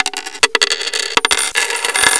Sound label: COINS